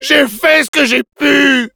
demoman jeers, fr; J'ai fait c'que j'ai pu !

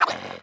throwable attack

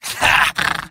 Medic laugh short

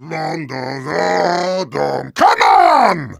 Heavy singing

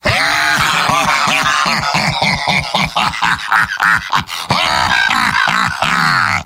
Heavy laugh long; Robot-filtered lines from MvM.; Heavy Robot audio responses

